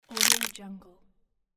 crunchy bite

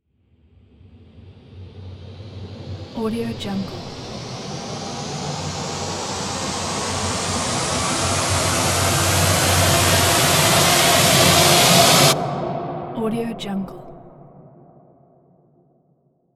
bloody nightmare riser layers; soundmorph

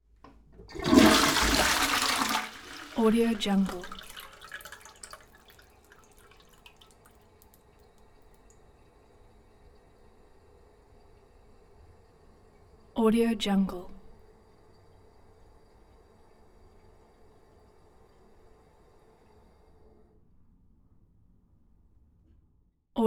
elements water toilet flush short full inflation; smartsoundfx

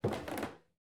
footsteps wood creak stair case; collanderson

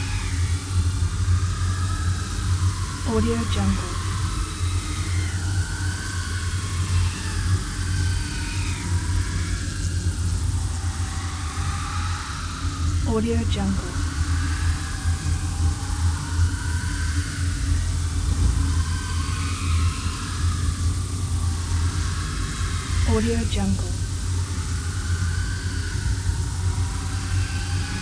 central core hive buzzing insect swarm

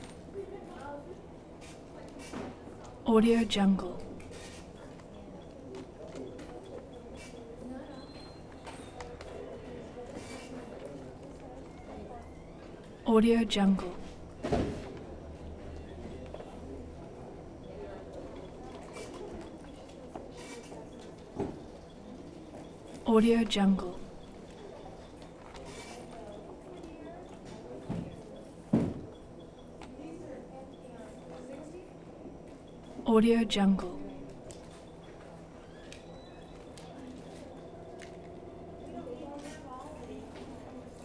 bank ambience; prosoundfx

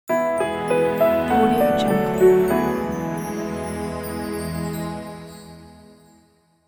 christmas logo

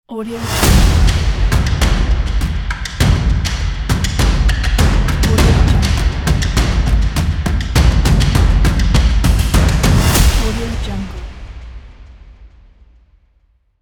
epic drums logo; musickidsound

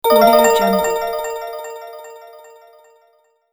sparkling win magic

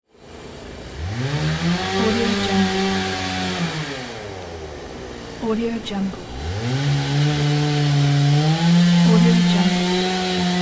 sawing chainsaw trees; jdproduction

